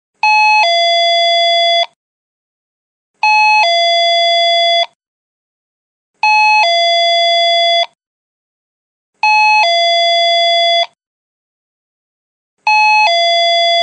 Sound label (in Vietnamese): tiếng chuông cửa hàng 7-11